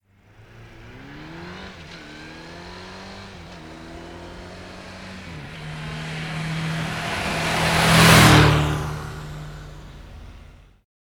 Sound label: Motorbike Suzuki 1100 Up and Pass Fast; Motorcycles